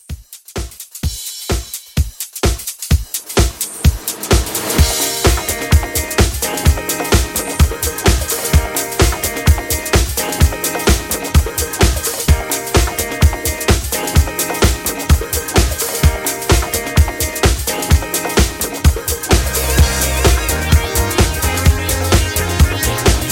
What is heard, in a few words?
70s Soul Re Drum 128